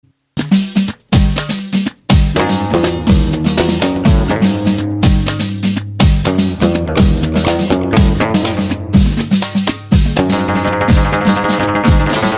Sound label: Ringing Amazigh